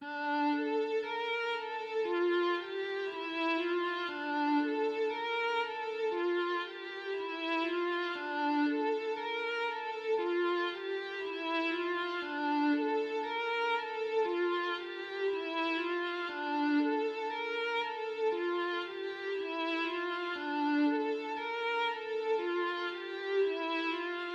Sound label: strings, 118 BPM D Min; Cymatics; Ares